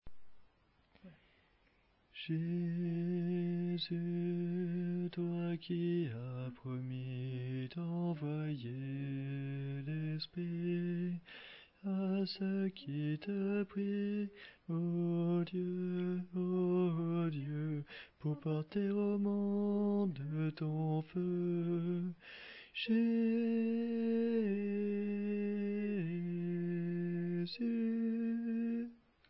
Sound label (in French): Voix de ténor